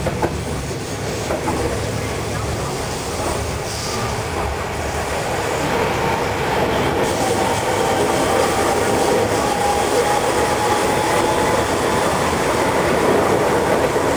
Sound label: Flange, Train; RBDe560